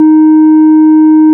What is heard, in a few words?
ORG VIP Flt 16' D#4, Farfisa; Keyboards of The 60's and 70's